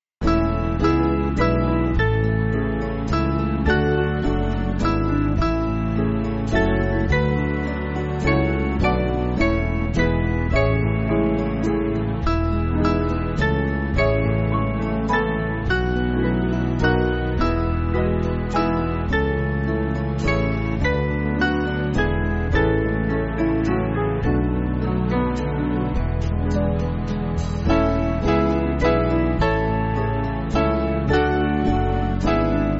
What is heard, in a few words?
Band, Backing Files for Churches; Spirit Divine Attend; Emmaus